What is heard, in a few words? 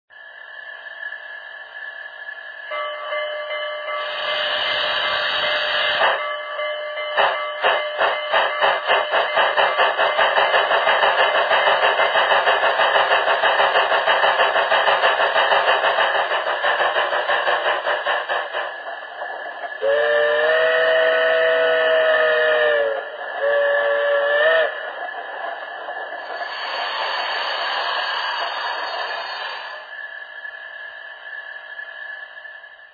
climax, loksound